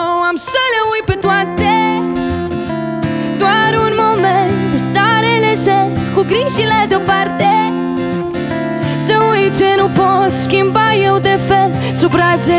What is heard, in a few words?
Acoustic Live